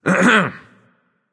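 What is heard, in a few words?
nonverbal clear throat; smite; voicepack; merlin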